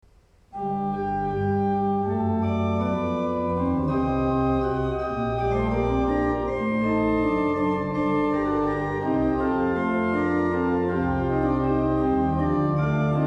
Organ, Canon; Two People Clinging To Jesus

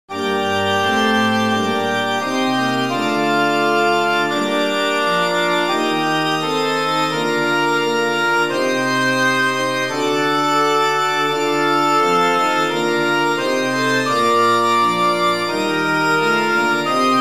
Ob Organ; S21-313 愛するイエス - 別和声

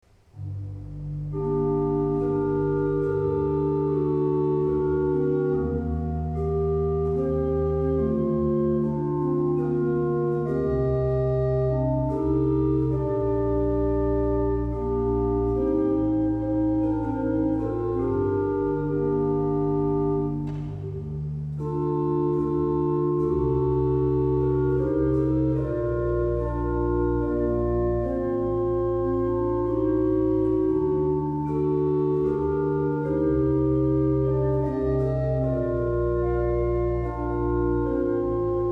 Organ; Carol Waiting For Birth

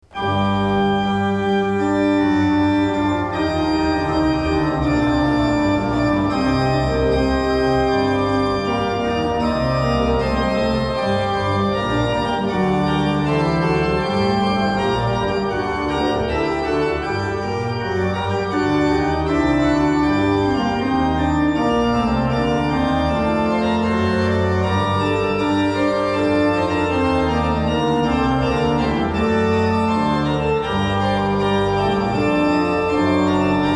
Organ; Cannon Of The Fierce Crowd

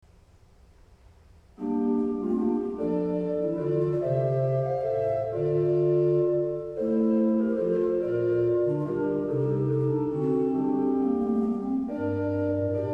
Organ

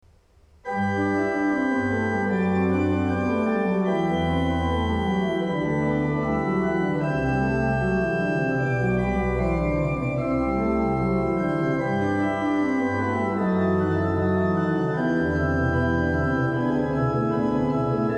Organ; Jesus Walking On The Lake

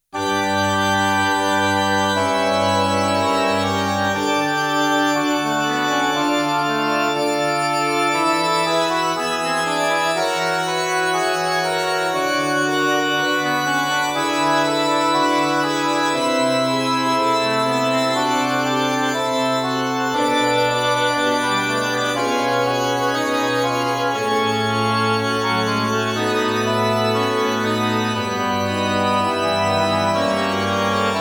Midi Court Fl Ob EH Fg CO QN 60

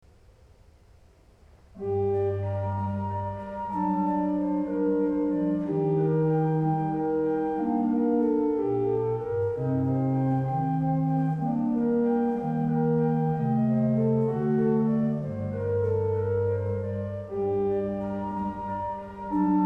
Organ; Peters Falsification

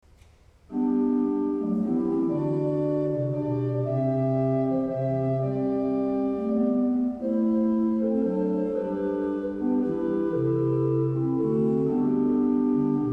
Organ, Baby Lullaby; Infant Jesus

